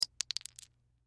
Bullet Shell; Bullet Shell 9mm Drop Cement; Drops (Sound Effects:Objects); Blastwave; Warfare

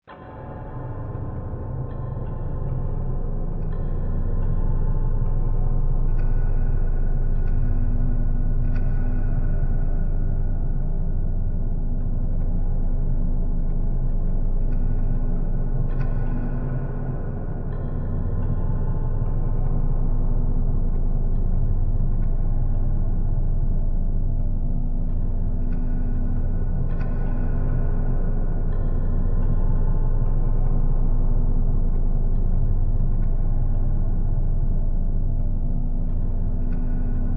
Drone Jungle Drums, Evil, Reverb, Slow; Drone Sound Effects

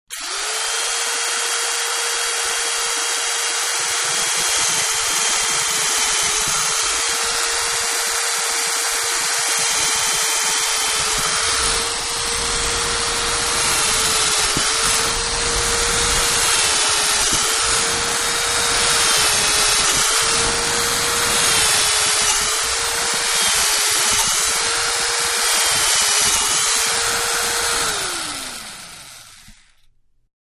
Electric Drill Several Holes; Drills (Sound Effects:Tools); Manhattan Production Music

